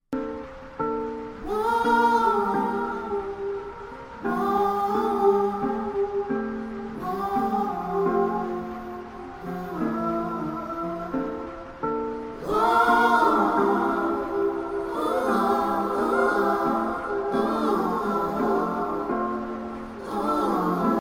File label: Text Tone